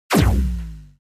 Multi Fly End

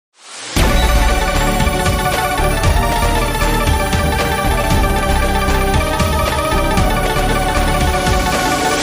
Big Win Bgm

